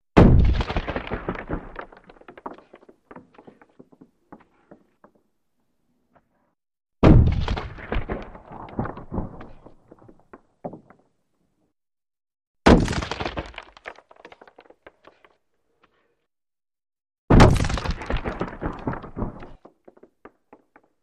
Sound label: Slower Big Rock Hits; rocks